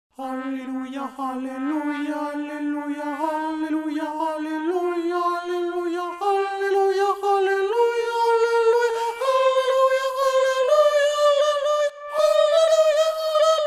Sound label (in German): Sopran; ALLELUIA